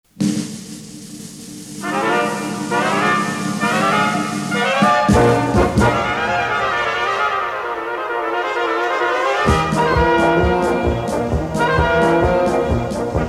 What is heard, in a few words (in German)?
Trompeten-Party